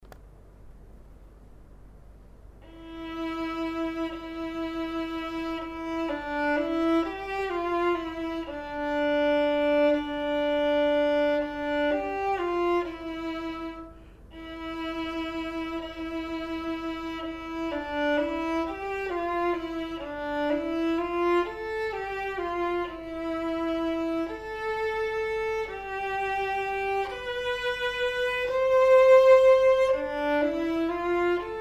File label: perf vln